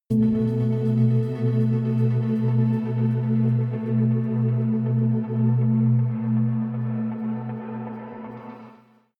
synth drone hit rhythmic high; drones

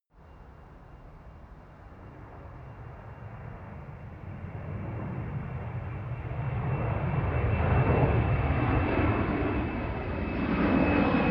plane flyby land tonal; flugzeuge verkehrsflugzeuge; verkehr transport

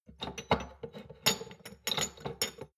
shot glasses clatter soft, gastronomie; berufe; soundpool

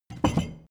drinking glass put down rattle; gastronomie; berufe

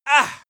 male hit

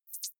hover high, signale

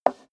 step high heels wood creak slide short

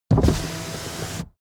car window front open part

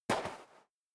step sneaker sand single right

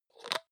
hygiene small cup open medium; haus-garten; badezimmer

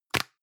plastic can lid screw close hard; objekte; dose

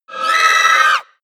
wraith die scream; schreie

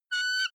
offspring eagle call, voegel; natur tiere

